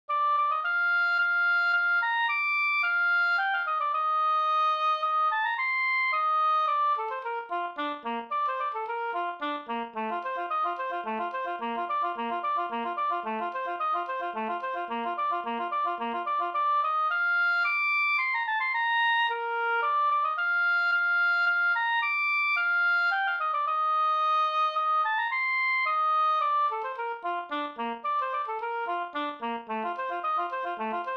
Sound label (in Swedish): slangpolska